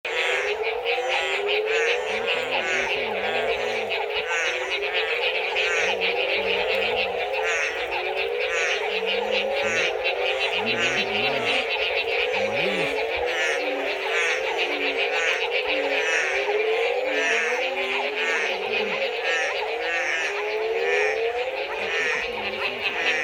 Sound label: calls of many frogs and toads on egg collection night